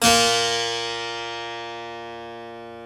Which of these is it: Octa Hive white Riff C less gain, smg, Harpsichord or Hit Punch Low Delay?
Harpsichord